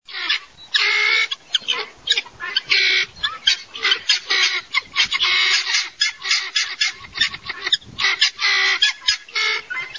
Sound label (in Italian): NUMIDA DAL CIUFFO